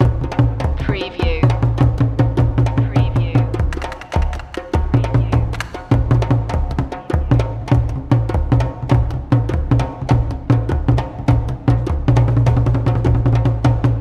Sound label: african drums ikibwenga nyakyusa fusion loop